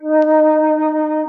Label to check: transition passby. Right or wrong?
Wrong